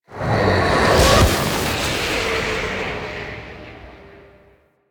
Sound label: Fly By Quick, Vehicles; Zariman Liset; Flight